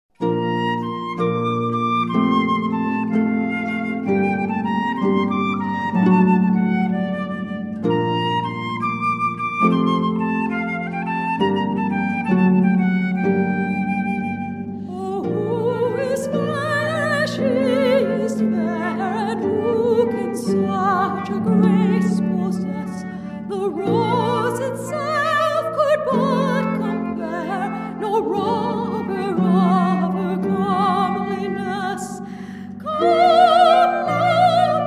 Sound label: harp flute voice ceremony; Wedding; Harp, Flute, Bass & Voice